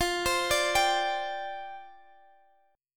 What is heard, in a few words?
D-Minor 11th, F